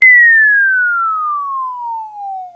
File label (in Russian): multyashnij zvuk padayushej bombi